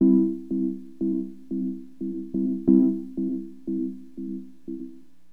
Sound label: SYN PNO, Keyboards; HipHop; LiveAct Pool 1